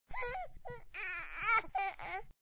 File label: baby whine; افکت صوتی